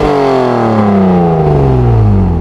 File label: atv throttle off, vehicles; hl2